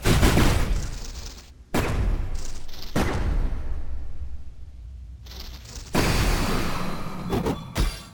sorcerer death; HLD

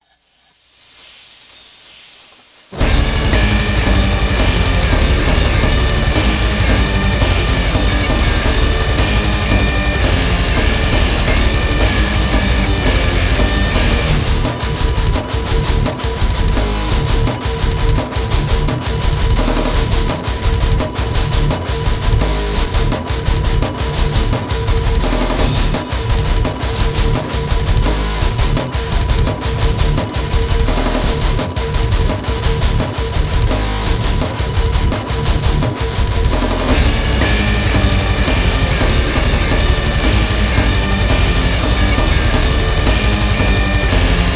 music; mechwarrior4

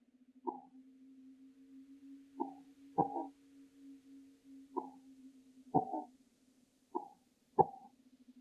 cough; guardian; HLD

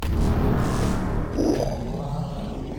sorcerer activation; HLD

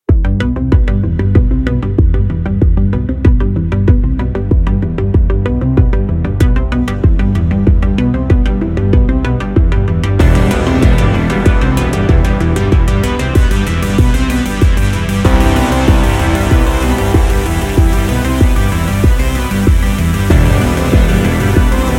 music main menu; synthetik

